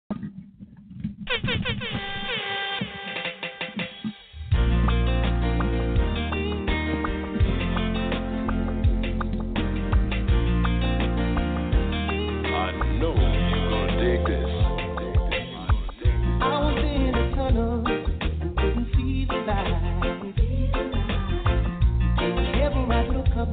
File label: reggae dance party smooth lovers rock; saturday night; caribbeanradioshow